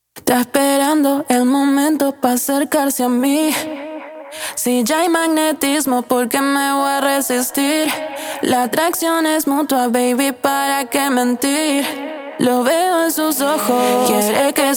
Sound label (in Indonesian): toma vocal reff